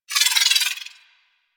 Pistol Proj Whiz; weapons; Warframe; Thanotech

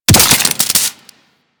Head Shot Metal, Bullet Impacts; Warframe; Weapons

